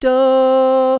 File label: easy melody tonic